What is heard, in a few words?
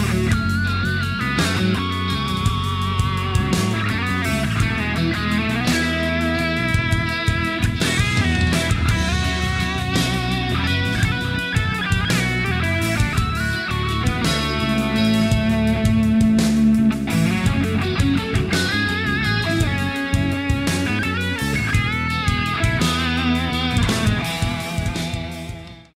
Guitar Rock Solo